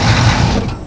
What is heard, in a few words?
humvee turn off; talhumvee; vehicles